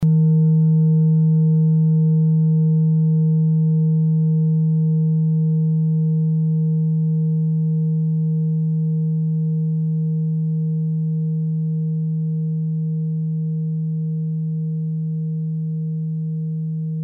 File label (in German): klangschale set; Klangschalen-Chakraset; Gewicht = 1160g; Durchmesser = 11,2cm; Höhe = 9,4cm